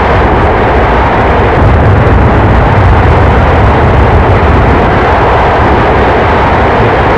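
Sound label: reverse, CFM56; A32X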